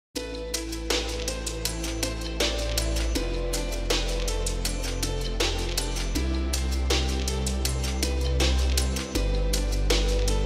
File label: phonk remix